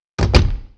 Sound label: door close old; Hellboy